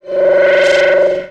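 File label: Bad Tight Delay; Jungle; Blu Mar Ten - Jungle Jungle - 1989 to 1999 Samplepack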